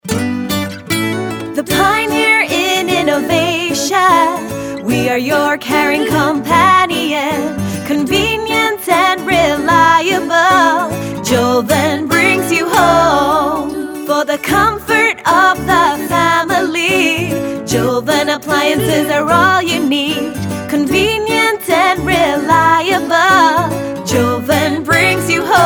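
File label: Jingle ENGLISH; FM Joven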